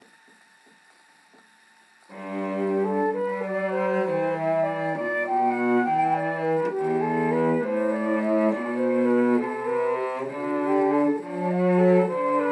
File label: Flute Cello DUO; Jesu